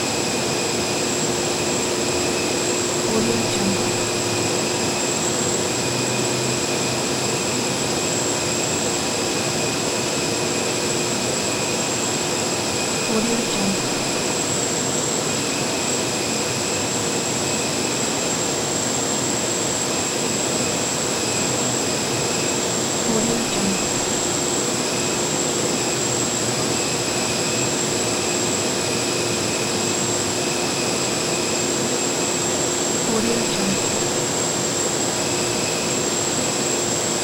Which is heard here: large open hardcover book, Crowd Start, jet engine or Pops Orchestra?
jet engine